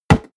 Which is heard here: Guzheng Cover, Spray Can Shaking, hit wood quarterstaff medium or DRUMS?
hit wood quarterstaff medium